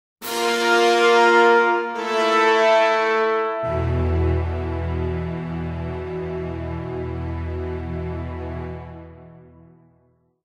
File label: Defeat By Neutral; Mount & Blade